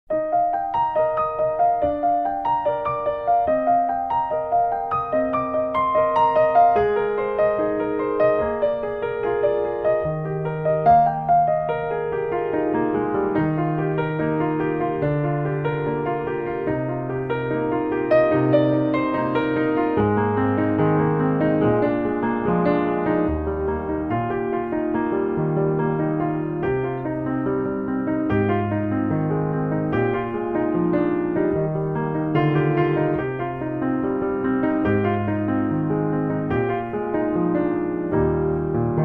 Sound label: acoustic piano